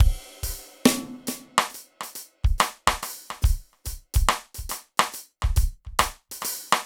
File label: Dub Loop, Drums & Percussion, Electronic; Sonic Foundry (Sony Creative Software) - Electronic Point Blank